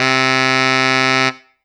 Roland JV-2080 Baritone Sax C3

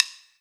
Sticks; Korg N1R

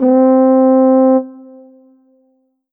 Ensoniq ZR-76 Flugelhorn C4

